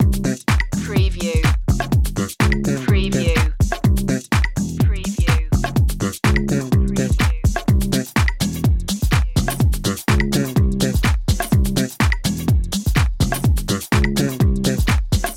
funky changeling loop

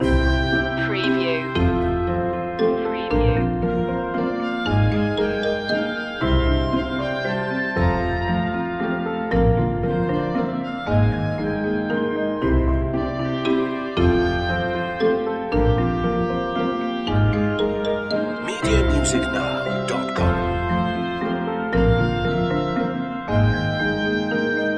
a lovely dance loop

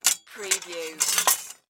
Sword Pick Up

